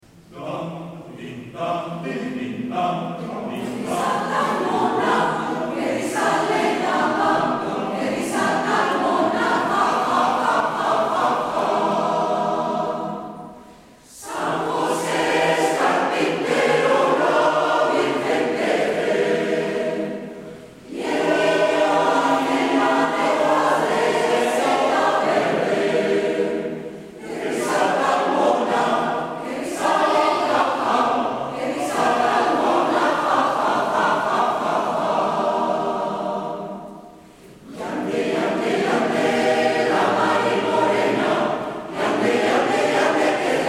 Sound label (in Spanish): La Marimorena; 2014 Colegio